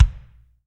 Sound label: BD, DR BD; Best Service Gigapack 2